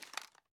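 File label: foley assault rifle ak47 inspect; weapons; wfoly ar ak47; viper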